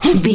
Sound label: voice male attack